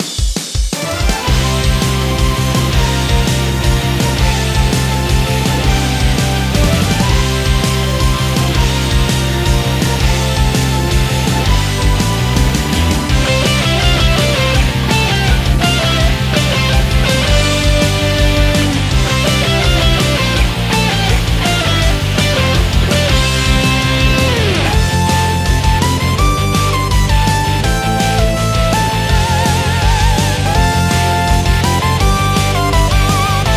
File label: Music Menu event